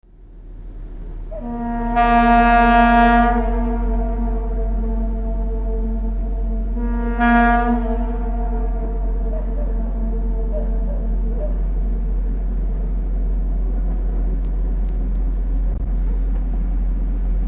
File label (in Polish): luzny gagar do Bortatycz